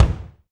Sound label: BD, KIT Real Kit